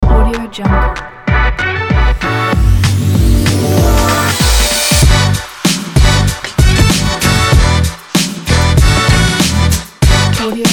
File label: vlog music kit